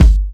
tight kickdrum sound g key, steel kick drum sound tuned to the G note